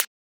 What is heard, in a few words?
Closed Hat; FLPRO